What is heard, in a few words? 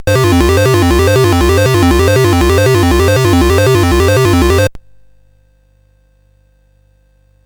Dragonworld sid CSG8580R5